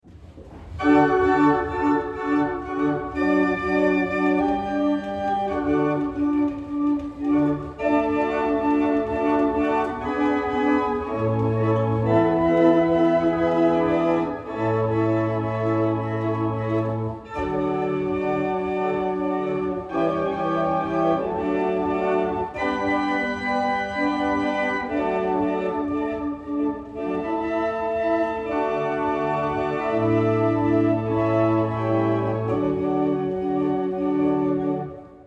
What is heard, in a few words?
Double chant, barrel organs; Norton